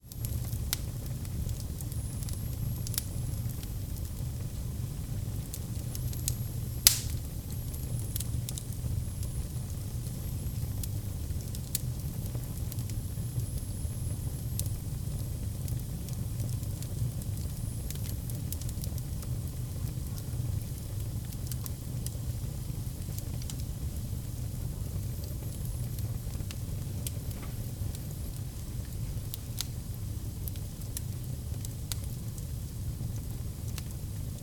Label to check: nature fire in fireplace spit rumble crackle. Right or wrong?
Right